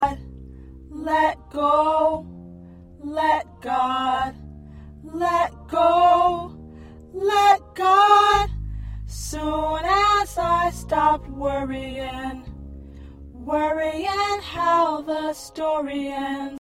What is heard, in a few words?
Tenor, choir